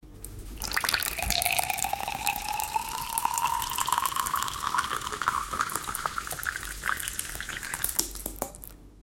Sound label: filling bottle with puddi